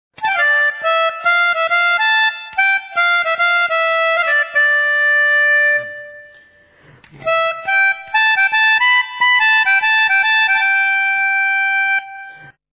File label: melodica